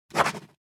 Scribble signature on paperwork

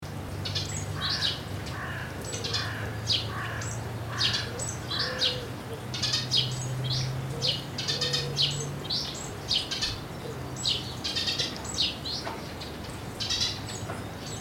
Backyard garden ambience trees wind and birds

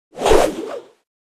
Rope swish fast whipping

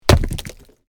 Hard soil rock pieces thrown on rock bricks

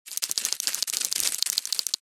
Small creature monster pass by

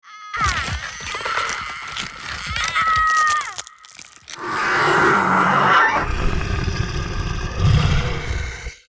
Werewolf transformation female

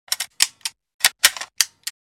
Nail gun handling

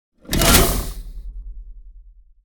Industrial steam release impact

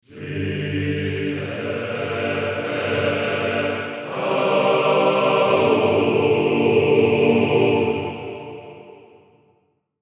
Hollywood choirs male closemic

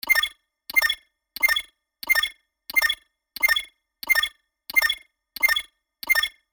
Sci fi atmosphere unauthorized access loopable

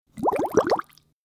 Cartoon bubble

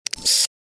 Camera lock; shorts sound effects

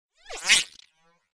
Futuristic swish transition

